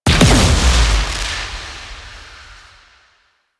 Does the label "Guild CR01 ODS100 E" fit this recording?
No